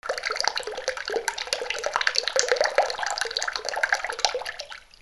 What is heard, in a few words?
BATHROOM